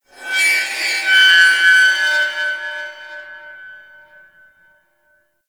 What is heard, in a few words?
High Metal Screech; Props; Warframe; Tau; Ambience